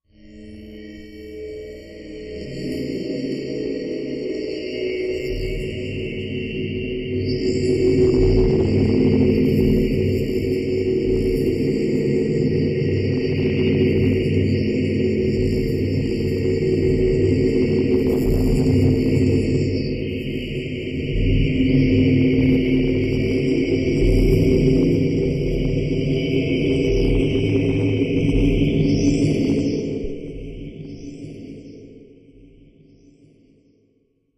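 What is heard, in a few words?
Strainer Slow Space Pulse, sci-fi ambience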